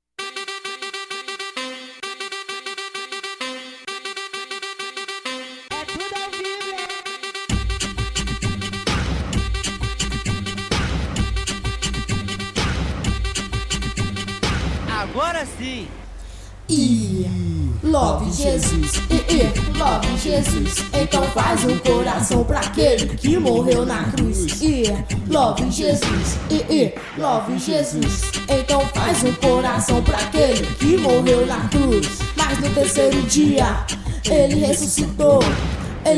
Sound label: funk